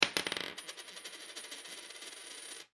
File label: Foley Coin Quarter Drop On Counter Short; Money; Objects